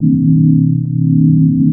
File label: Goblet Drone